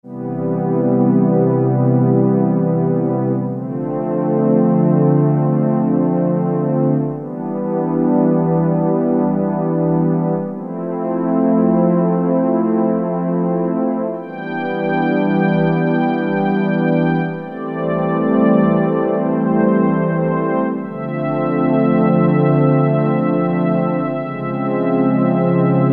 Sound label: pad warm up; E-mu Proteus FX; Factory Presets